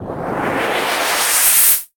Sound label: ladder Climb Sweep; codex of fortune